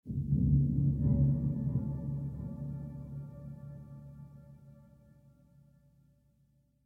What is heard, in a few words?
Lap Steel Rhythmic; The Last of Us; ML Lap Steel Rhythmic Mood